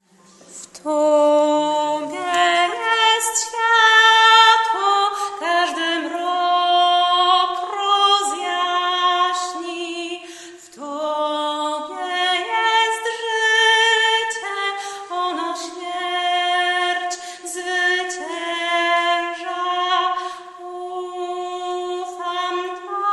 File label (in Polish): sopran